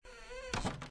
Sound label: wooden door close; out of reach treasure royale 2020 gamerip pc